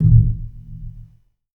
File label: JUG HIT